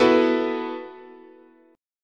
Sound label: B 7th Suspended 4th, guitar chord